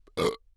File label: burp; OPENAIR; Anechoic